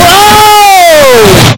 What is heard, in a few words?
wooohhhh loud